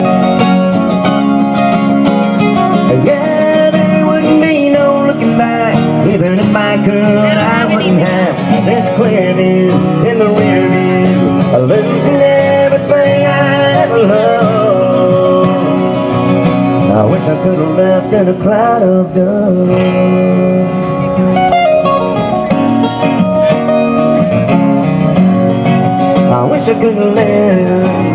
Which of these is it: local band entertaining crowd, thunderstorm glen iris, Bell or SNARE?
local band entertaining crowd